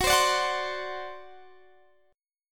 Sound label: D 7th Gb, guitar